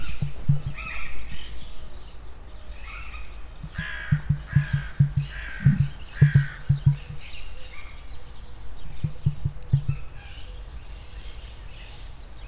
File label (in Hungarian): varjú, zöldküllő, emu; debreceni zoo 2012